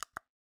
click

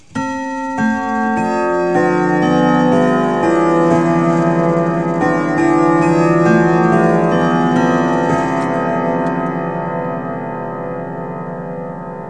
Whittington 1⁄2 Past, Chimes; KABOOM! - Wild and Wacky Sound Software; Household

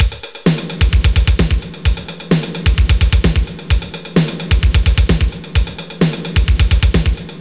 Electropop, DRUMMACH; Leonardo The Inventor; Broderbund; Riverdeep

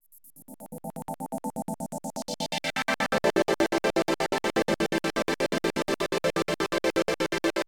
SYNTHPAD, HOUSE, 125, A; eJay; Sphere

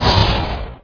fan destroy; starship trooper

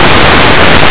mail

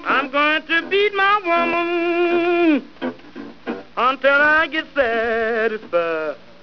beat

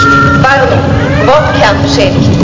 warp kern; Enterprise; Amiga 8-bit Sampled Voice

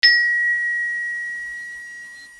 Ding; Princess and the Pea; SoftKey

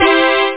trumps

